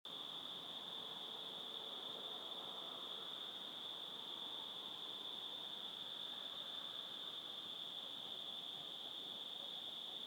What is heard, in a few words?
Spring Night; Coronavirus